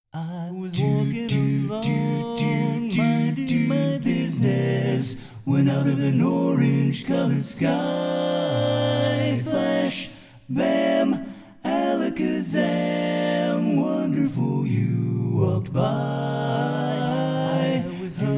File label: TENOR